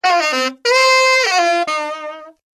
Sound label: reed; Sony Ericsson Xperia X10